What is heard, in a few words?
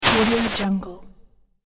games retro noise failure